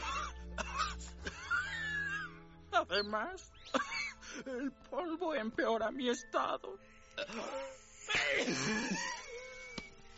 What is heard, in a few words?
tos wheezy